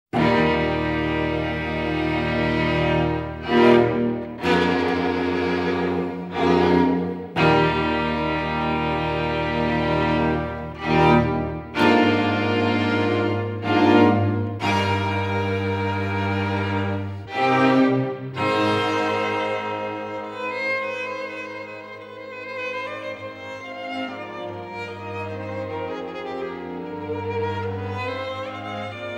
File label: String Quartet in E-Flat Major, Maestoso - Allegro; String Quartet No. 12; Op. 127; Ludwig van Beethoven; Beethoven - String Quartets Nos. 1-16